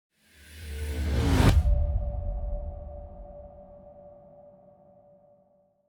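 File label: Music Electronic Transition Pre race start; Forza Motorsport 6 Apex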